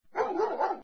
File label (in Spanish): perro